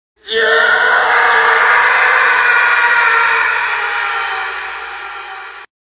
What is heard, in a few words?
man scream with echo; human